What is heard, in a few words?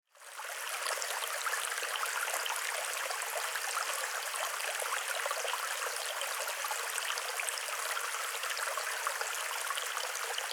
Forest Brook; waldbach; relaxound zwitscherbox satellite waldbach